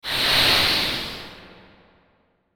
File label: Whoosh Static Airy; Blastwave